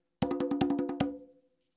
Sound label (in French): frise, congas